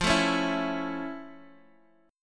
F Augmented Major 7th Guitar Half Step Down, chord